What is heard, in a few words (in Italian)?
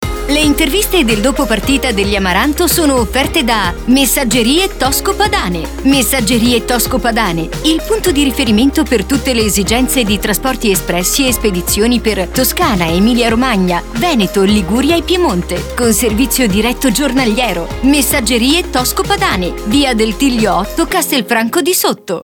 jingle interviste; Radio Bruno